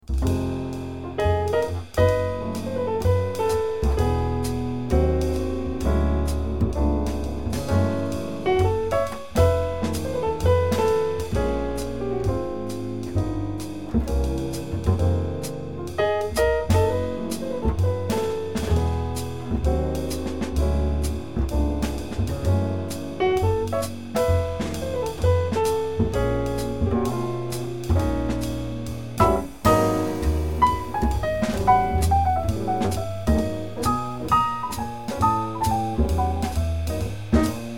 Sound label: trio